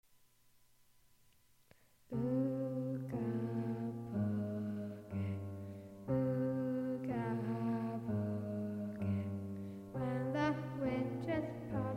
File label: Vocals